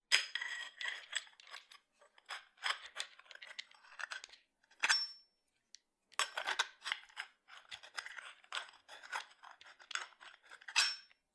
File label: Salvaged Pipe Crafting; Scrap Weapons; Zomboid